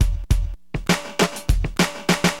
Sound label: Drums, Acid Jazz Funk; LiveAct Pool